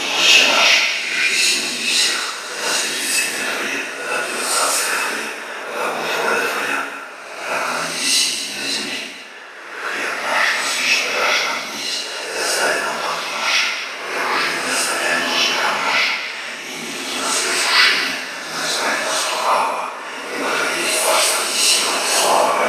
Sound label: ghost; metrocraft; npc